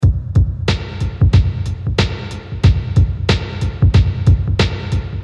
Loop, How our loop sounds with the Delay added; Clean Digital preset

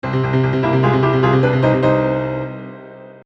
Humanize, piano part

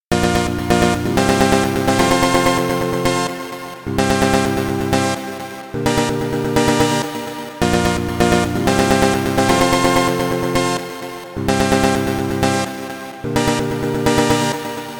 melody, edm melody; Ableton Live MIDI effects

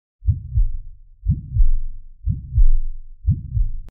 Reverb, heartbeat sound; Absynth

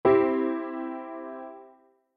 C major; MIDI effects in Ableton Live

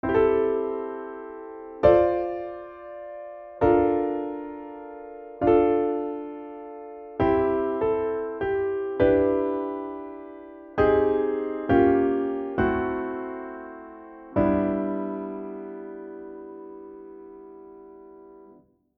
sixth chords

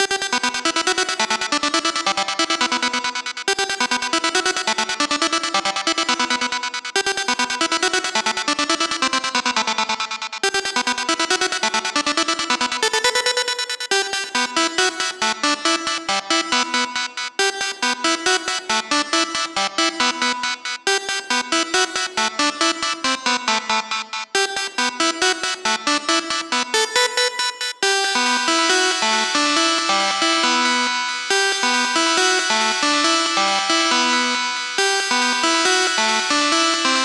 Lead vibrato; auto pan gate

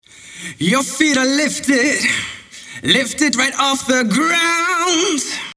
rock delay; vocal delay

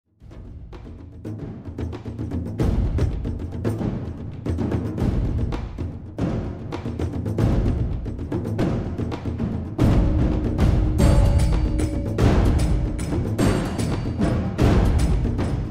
Percussion Enhanced; epic drum sequencing; QL Stormdrum

